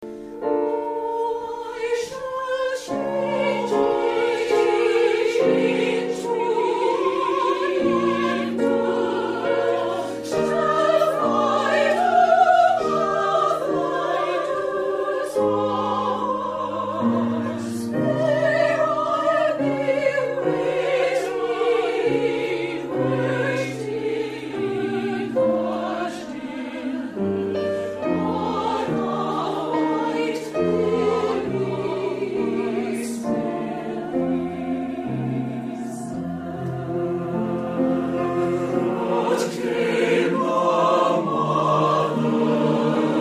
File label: Wedding Song from Poniky